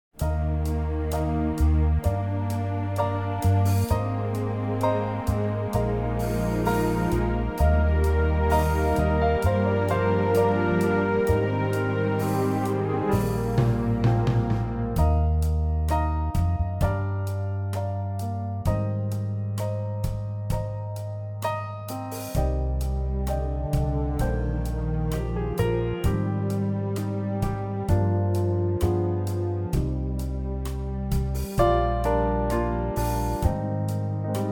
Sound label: Eb orchestral; key - Eb - vocal range - Eb to Ab